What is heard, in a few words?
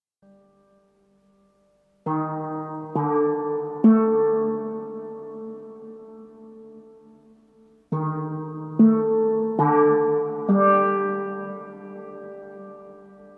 Steel drum